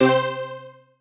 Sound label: countdown